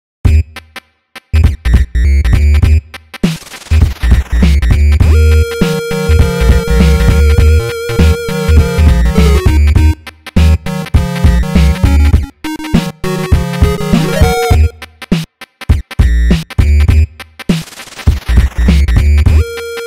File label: 8-Bit Tunes